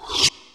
sweep; Sonic Foundry (Sony Creative Software); Ugly Remnants VOL-2

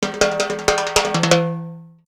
Live Perc Riffs; Jungle Frenzy 1

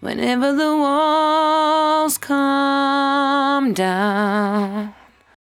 74-5bpm B, Velvet Vocal; While U Could; Zero-G

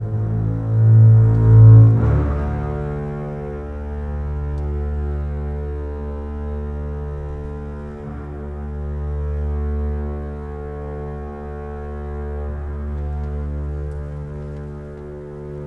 Strings; Sonic Foundry (Sony Creative Software); Orchestral Series VOL-4; Rock and Pop; Rock-Pop 14

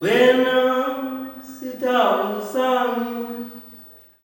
"WEN-NA", MALE; Spectrasonics; Heart of Africa 2; S.MALEAMB

